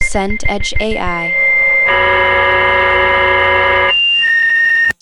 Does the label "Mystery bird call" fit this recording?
No